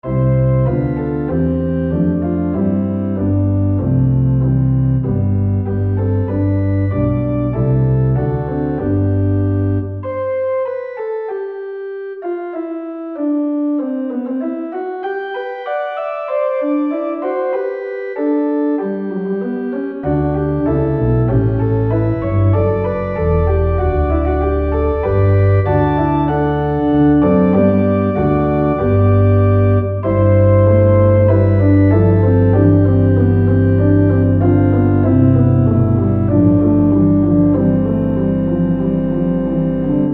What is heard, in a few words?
Udgang fughetta